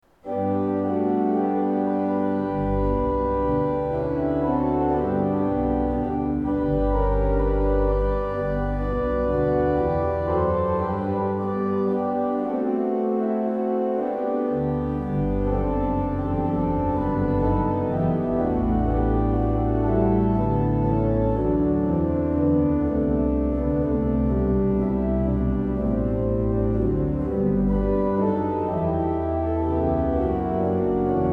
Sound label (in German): vorspiel g; brosig